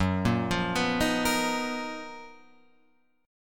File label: F sharp Major 13th Guitar Open F; arpeggiated; JGuitar